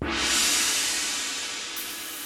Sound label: Crash